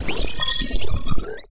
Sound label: alien game ui glitchy sci fi bleep digital short laser